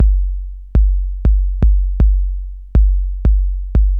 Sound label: RYTH